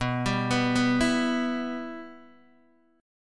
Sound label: B Suspended 4th Sharp 5th, Guitar, Open D